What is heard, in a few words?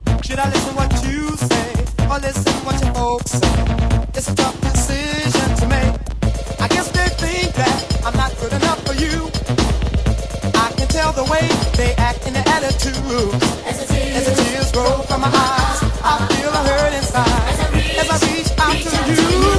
Trapped (Electro)